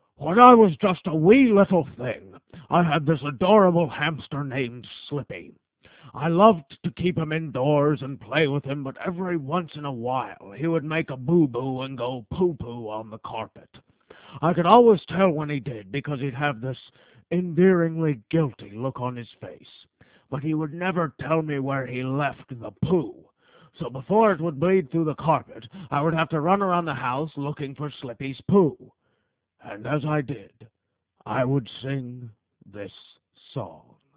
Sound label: song slippy narrative